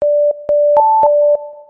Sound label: Ringtone; Blood Signal